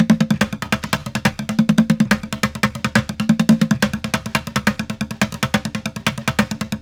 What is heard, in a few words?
Street Groove, Ambient Grooves